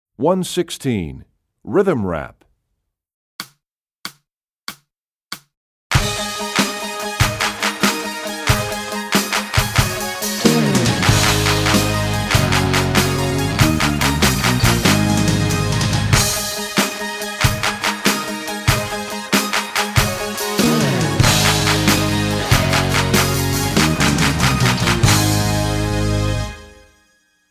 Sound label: Rhythm Rap, Percussion; EE2000 Book2